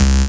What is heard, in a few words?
Synth Bass; Vengeance Samples; VEE Synth Shots